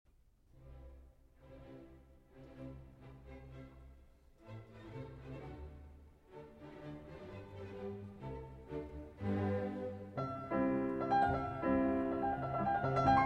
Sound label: Piano Concerto in G Major, Rondo. Vivace; Beethoven; No. 4; Op. 58 III.